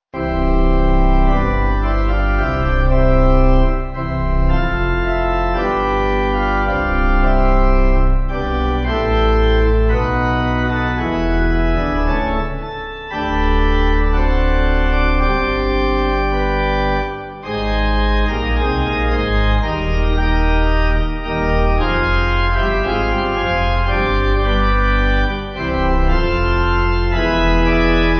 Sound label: O Lord Of The, Pipe